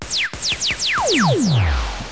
Laser effect, Kermis Jingles; Kermis Jingles Diversen

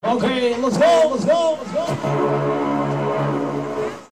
jingle; Kermis Kassa Diversen

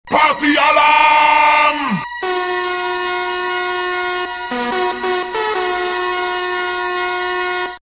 Party Alarm; SoundSamplerBoard

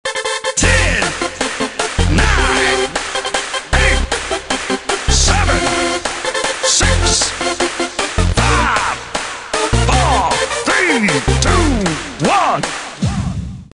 Zamba Zamba; Kermis Jingles Diversen

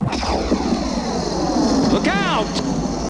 Straal look out; Sound Effects Deel 1

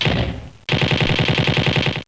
Gun; Sound Effects Deel 1

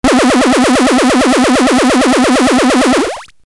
Mulitlaser effect, Kermis; Kermis Algemeen Deel 1

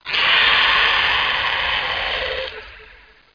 monster; Spookslot Tunes Effecten

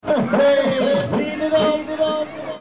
jingle; Kermis Kassa Diversen

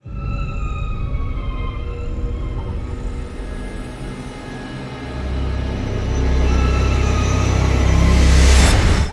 Scarry effect; Spookslot Tunes Effecten

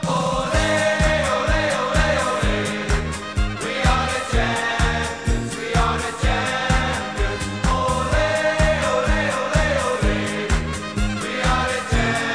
oleeeee; Kermis Jingles Diversen

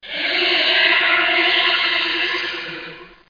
monster; Spookslot Tunes Effecten

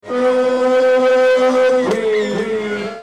jingle; Kermis Kassa Diversen

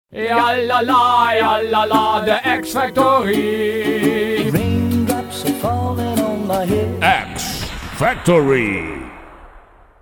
lalala raindrops; X factory

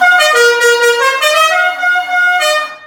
Horn, Kermis Horns; General Lee Horn

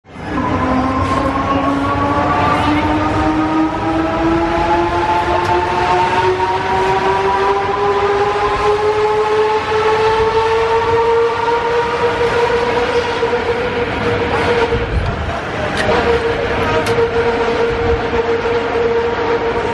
Break Dance Motor; Kermis Breakdance Jingles Motoren

